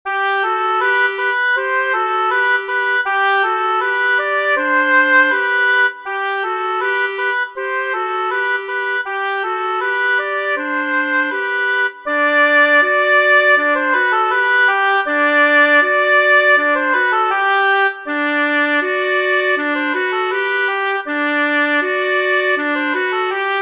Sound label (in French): clar sans claves entier; Gavot